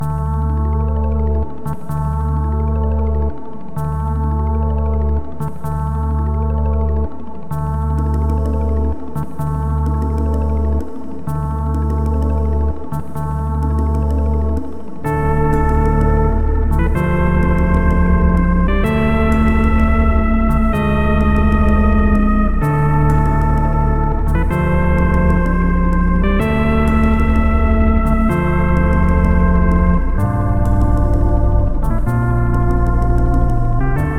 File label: Dungeon; Brutal Wolfenstein; Doom II mod